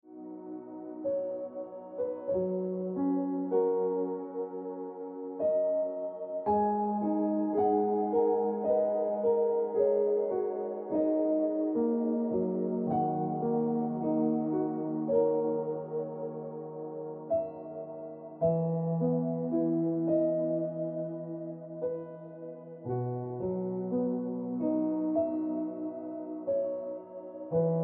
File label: Piano Music